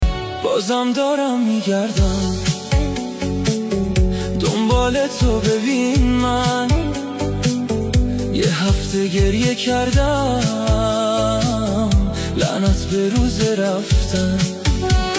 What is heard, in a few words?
music sad; آهنگ غمگین گریه آور مخصوص اینستا